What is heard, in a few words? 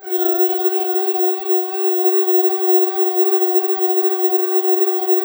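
Gospel; Best Service Gigapack 1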